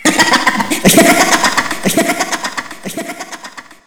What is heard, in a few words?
skill casting